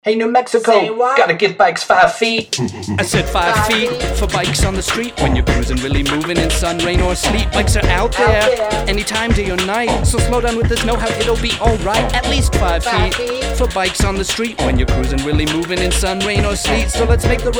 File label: Rap